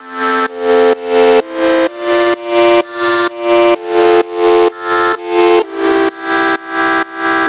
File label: synth 128 Dm; Electro; Anthemic; synth loops